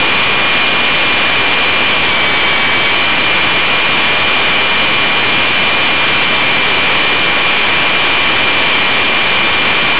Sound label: static